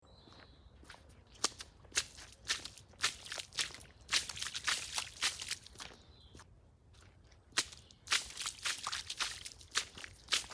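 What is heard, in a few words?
shagi po lujam, footsteps through puddles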